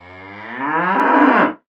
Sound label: brahmin idle moo